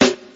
good steel snare drum sample g key, tuned drums g key